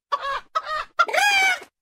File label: chicken emote; clash royale